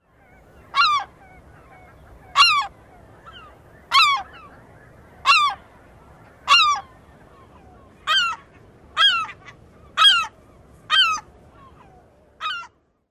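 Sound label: typical keeyer calls, bird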